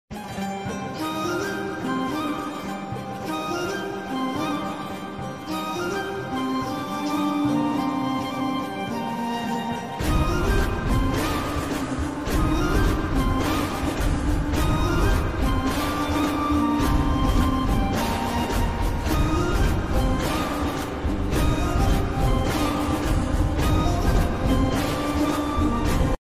song bgm ringtone; amaran

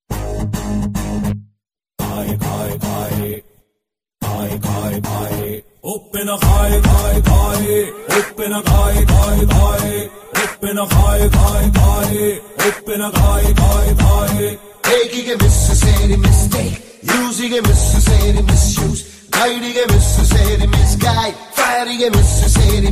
kannada ringtone mass ringtone bgm tunes; super movie; upendra

